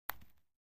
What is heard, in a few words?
Golf Putter Putts Ba